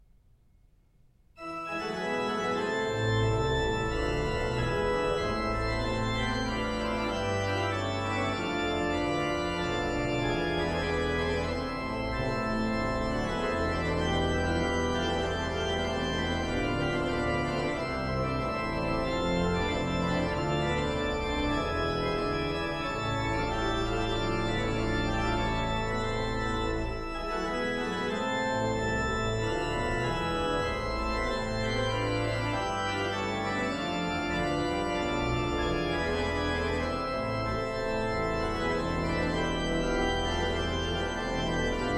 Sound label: organ, wer nur den lieben gott lasst walten; organ and coral pieces; j s bach